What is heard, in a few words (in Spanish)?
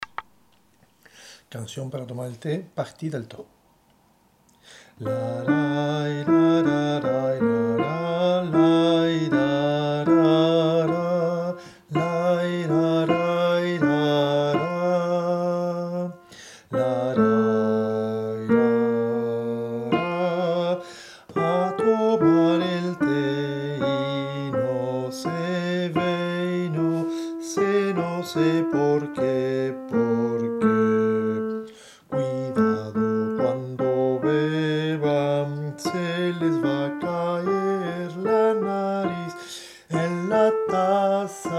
alto